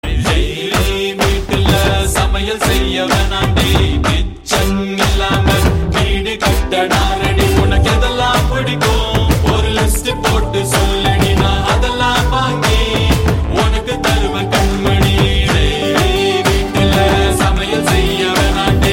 Food Song Ringtone

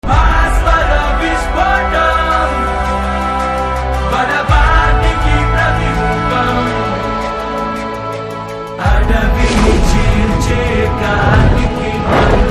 Telugu Trailer BGM Tone; Vishwaroopam 2

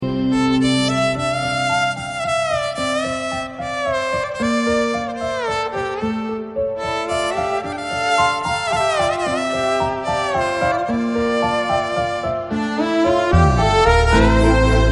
Melody Ringtone

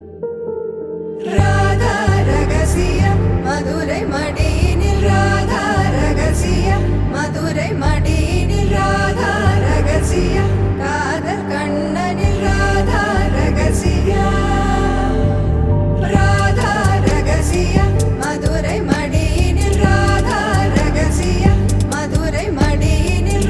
Solo

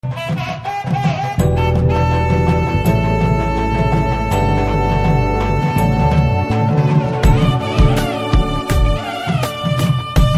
BG Drum Beats Ringtone; Cobra

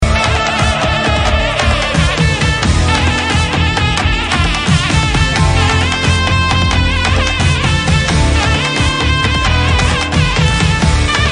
Beat Ringtone

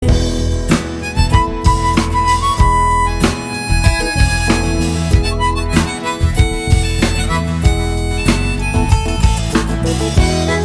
Love Bgm

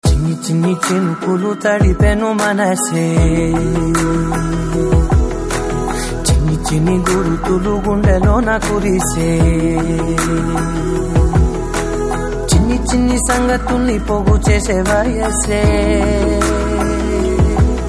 Ringtone

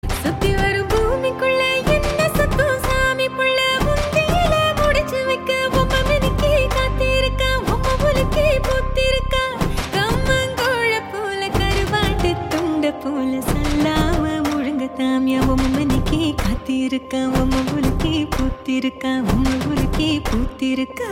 female ringtone

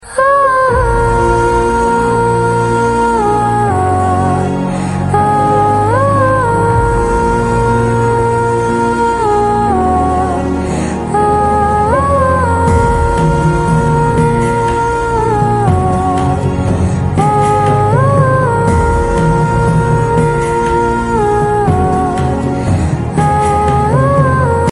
Humming Ringtone

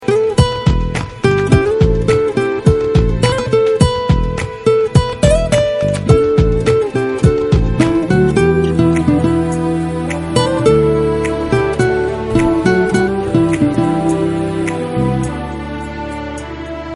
Guitar Ringtone

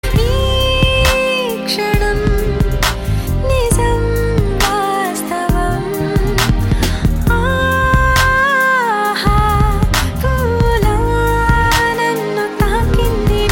Love Ringtone

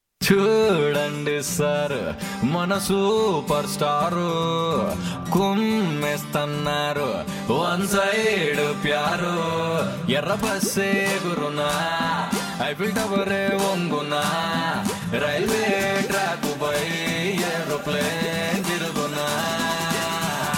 Song Music Bit

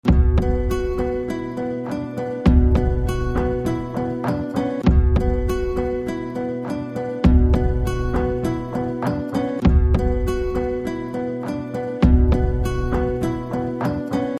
Tamil BGM Ringtone; Psycho

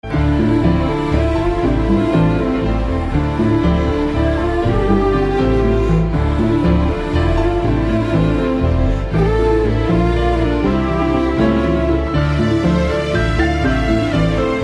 BG Tone

